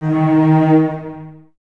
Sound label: Cellos D#3 Detache; Miroslav Vitous; String Ensembles